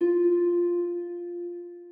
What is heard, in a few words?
harp